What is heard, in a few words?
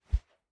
Fighting Woosh; SoundsCrate